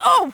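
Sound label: f oof, female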